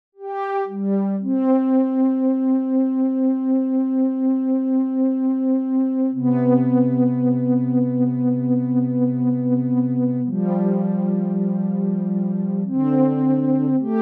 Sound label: F120 Impro lead1